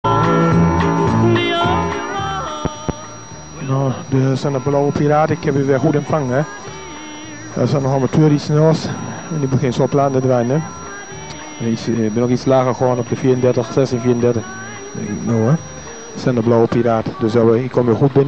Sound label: 1637kHz